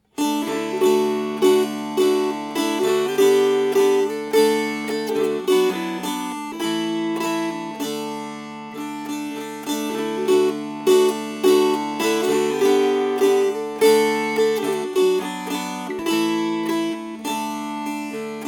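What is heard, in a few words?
Strummed, Dulcimer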